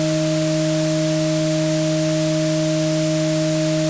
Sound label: haha